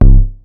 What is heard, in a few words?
Bass Drum, Cwejman S1 MK2, Drum Machines